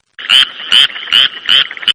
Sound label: FROG; Animals; Partners In Rhyme